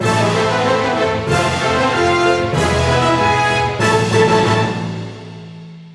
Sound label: Battle Cleared; mario rabbids kingdom battle the complete gamerip switch gamerip 2017; Spooky Trails Unknown 1